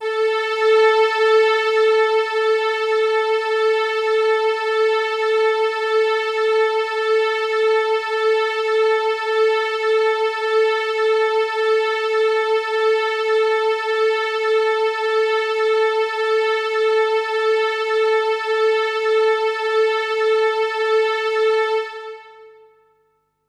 D&B Pad; Keys; neuro

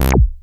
SHORT ACID, BASSES

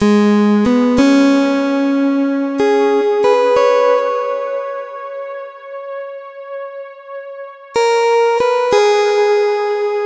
Main Lead Synth, 93bpm; Zero-G - Soundclash Nu Dance Electro; Acidized Wavs; Construction Kits; Smooth Circuitry

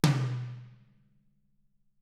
Toms; Bob Clearmountain Drums I

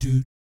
DOOT C3, Jazz; ILIO; Vocal Planet; Jazz & FX; JAZZCOMBO

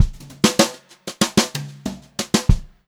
brushed drum loops, fill; USB Soundscan vol.39; AKAI; 96 HOTROD

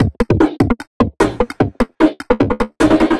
150 Krisp N Dry Drums Fill, 150bpm; Combi Loops; Nu Dance Electro

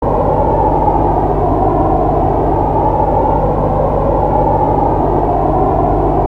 Ground, Industrial

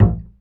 acoustic bass fx; Spectrasonics; Bass Legends

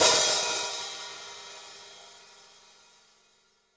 hihat - opened; JazzFunkKit

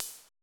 cymbal, Roland MC-909

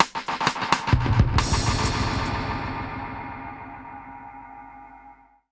Drums A Kit Echo 130, dub drums; musicradar

